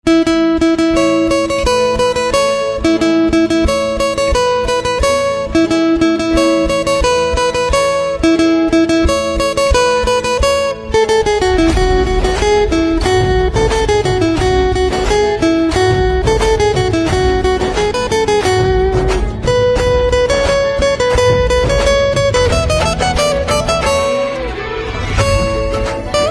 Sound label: Guitar